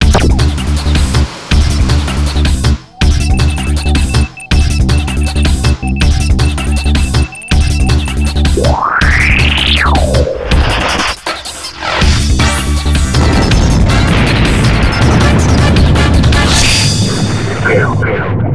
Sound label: Fail; Confidential Mission; Dreamcast